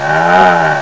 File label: moo